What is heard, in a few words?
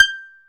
baglama G 5